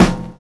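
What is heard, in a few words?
BROKEN SNARE; Univers Sons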